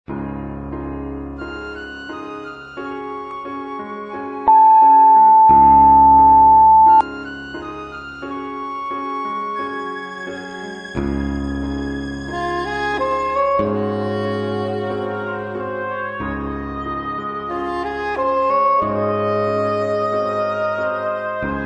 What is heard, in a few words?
woodwinds; Beauty And The Beast